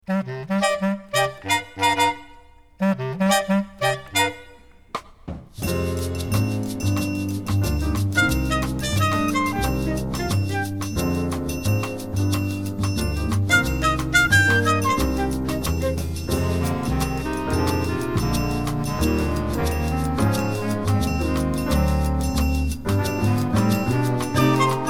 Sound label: minus melody, bossa/samba; Our Minus Melody track is quite straightforward.